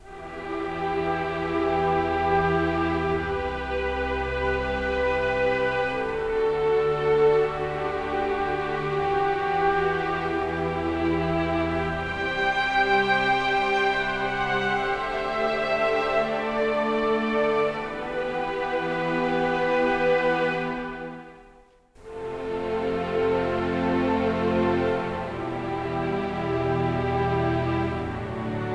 Graveyard - Loop (Strings Stem); ps4 ps5 switch windows xbox one xbox series xs

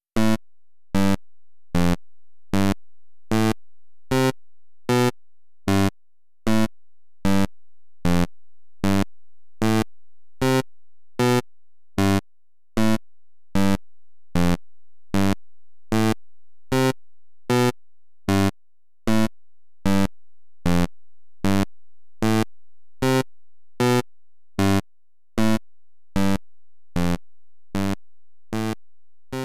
8bit buzzer; PENGO!

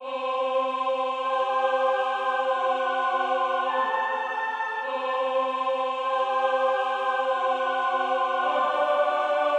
Drums and Jingles Evil Synth A

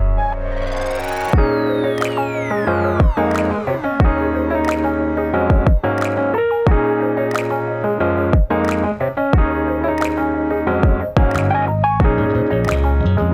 Menu Lobby; Goddess of Victory Nikke

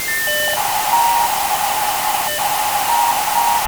white noise; front mission 1

